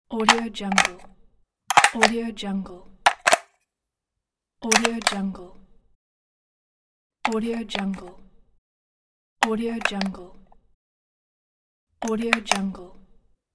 insert battery

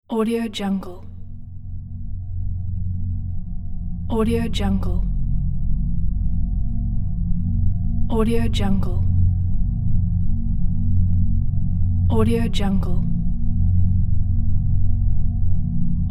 beneath dark mountain

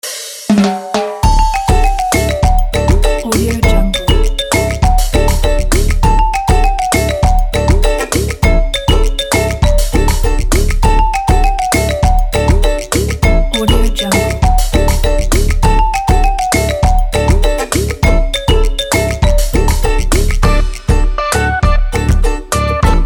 happy summer beach

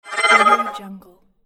interface

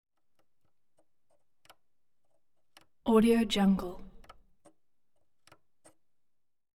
efx int turntable switch; collanderson

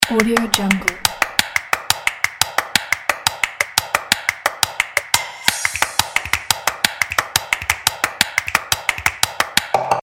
hybrid claps opener

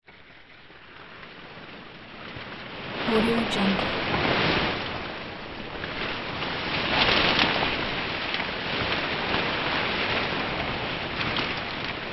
foliage movement hiking

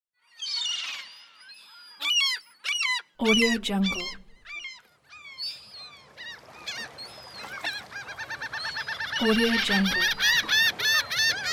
seagulls

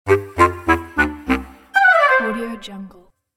cartoon orchestral tip toe down

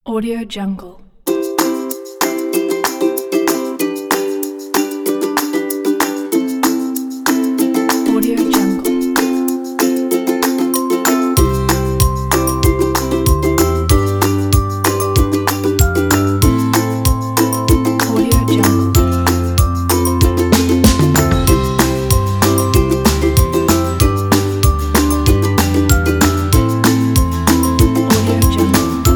happy folk

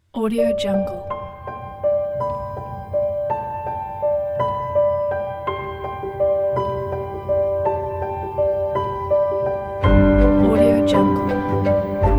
intimate piano

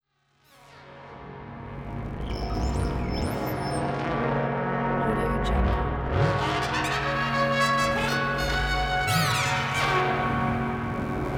enchanted trumpet ambience

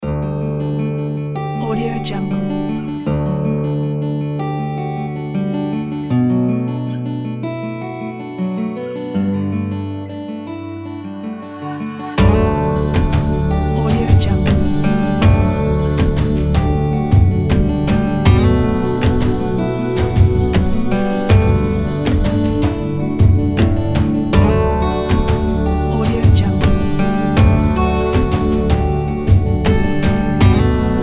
quadcopter video background

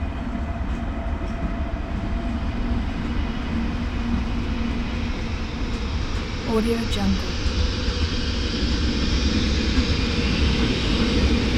train noise ambience

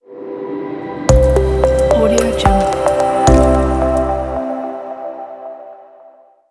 intro logo media; avalonaudio

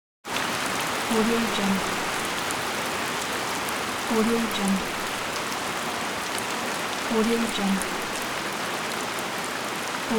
light tropical rain on ground; hollywoodedge

